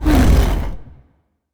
Stone Move; helltaker

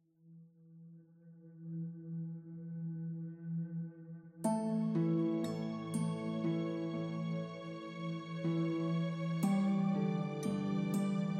Loop Warm

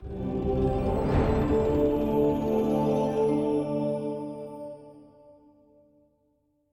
STING; CHICKEN REVEAL; The LEGO Ninjago Movie Video Game; PS4; Windows; Xbox One

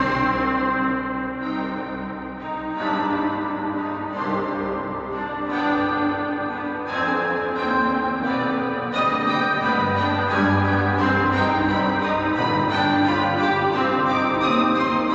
Mus Flex Weird strings

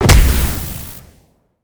Damage; helltaker

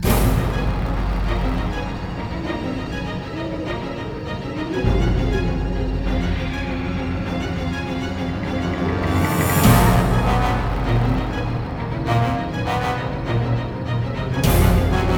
Spooky Combat; Shrek 2

